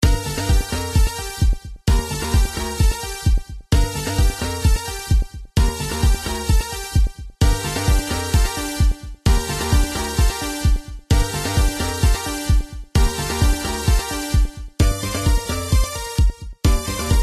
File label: Carol Trance; Mechanica A Ballad of the Rabbit and Mercury; Family Computer